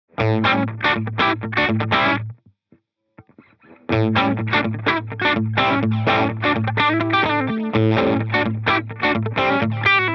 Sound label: Tape Old Tape, echosystem